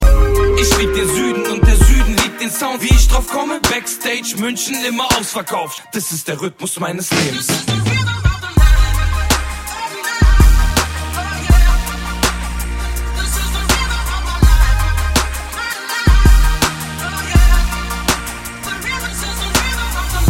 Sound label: rhythm